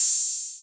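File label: Open Hi-Hat 808 Color B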